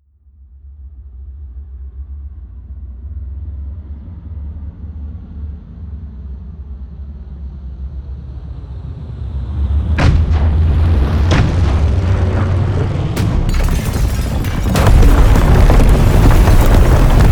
air strike; krieg waffen; flugzeuge

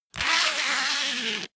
zipper pullover jacket close; objekte; reissverschluss

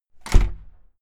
entrance door close slam hard outside

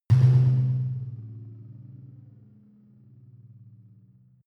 sci-fi vehicle engine stall low; raumschiff

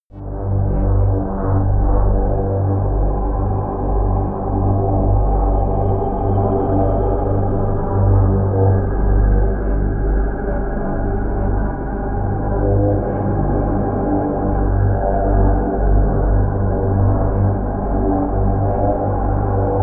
drone trouble

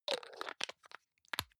hygiene small screw cup close medium, badezimmer

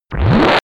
short muddy rise, Risers; whooshes rises

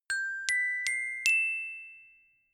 notification glockenspiel dry sequence ascend

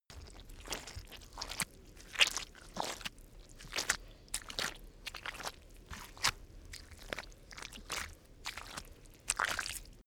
step mud walk; schritte

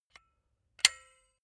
silicone gun bullet lock mechanism heavy; berufe; handwerker; soundpool

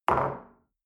shell place on table hard; soundpool

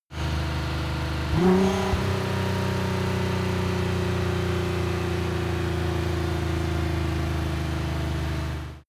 car nissan gt-r 35 decelerate rev up

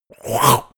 monster attack gurgle; koerpergeraeusche; comedy-cartoon; soundpool

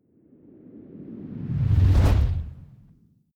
fire whoosh synth big flame; swooshes-swishes

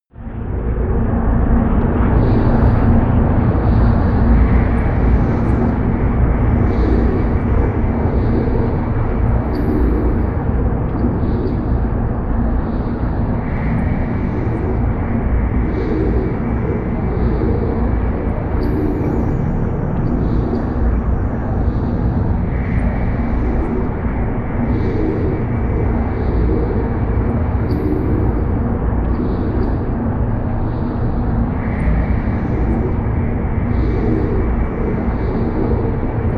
drone swarm

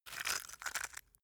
stone scrape short hard; natur-tiere; steine

